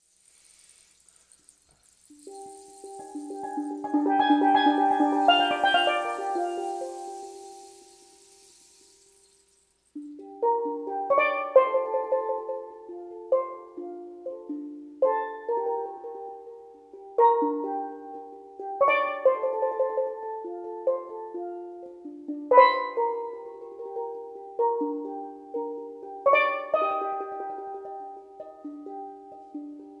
steel drum band

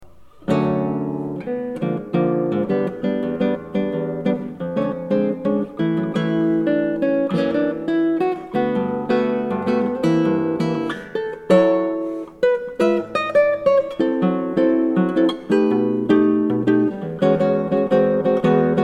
Samba Brazilian, Guitar

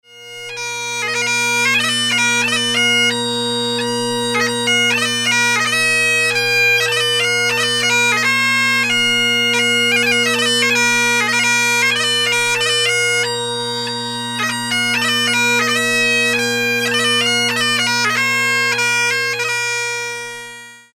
Scotland the Brave, bagpipes

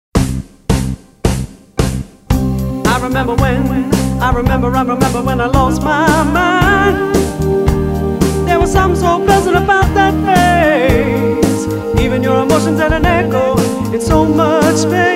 Dance Band

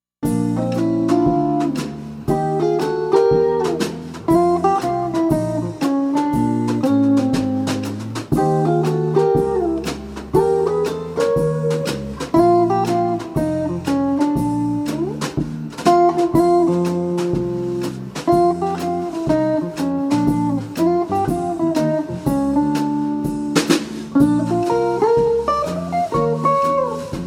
Jazz Blues, guitar

